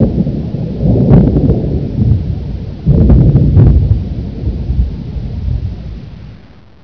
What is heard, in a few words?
thunder